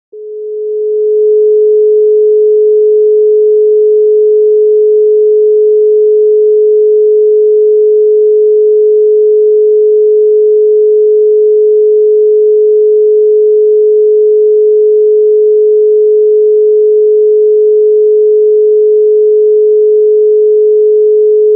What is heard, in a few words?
426 Hz; Third Eye Chakra; Deep Intuition Awareness